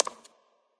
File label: footstep; smite; scylla